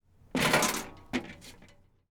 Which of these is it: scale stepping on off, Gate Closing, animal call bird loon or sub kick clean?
scale stepping on off